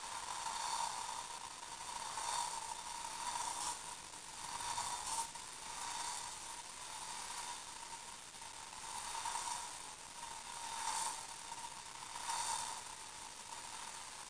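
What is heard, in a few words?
hair spray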